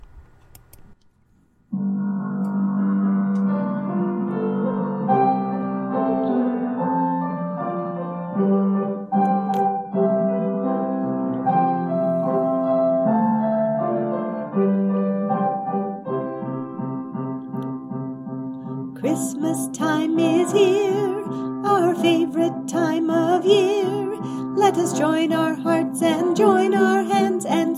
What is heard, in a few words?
Sing of Christmas Joy with vocal